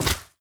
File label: step light, footsteps, player, mud